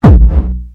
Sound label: Hard Kick